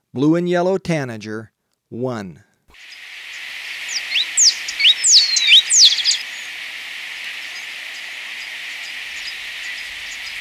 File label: Blue-And-Yellow Tanager, Birds of Ecuador; S. America